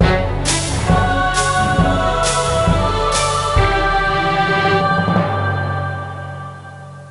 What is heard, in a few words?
Pirate Victory; Lego Battles 2009 NDS